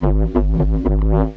Bubbles 175bpm, Drum and Bass Percussion; EZ Rollers

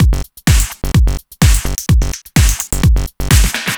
Glitch, Drum Loops, 127 BPM; Sonic Foundry (Sony Creative Software); Underground UK House & Electro

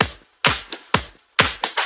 Clubhouse Loop 128 BPM

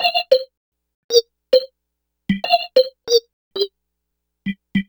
phunkorgan; Sonic Foundry (Sony Creative Software); Ugly Remnants VOL-2

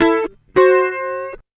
92 BLUES, Acoustic Guitar Loops; USB Soundscan vol.04; AKAI

